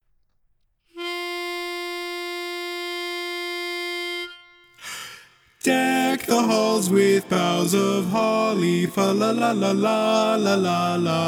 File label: Deck the Halls lead mono; Christmas